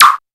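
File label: HELI ALIE, TECHNO TRANCE; Pro-Rec - Synthsonic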